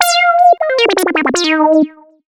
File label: lead (brass)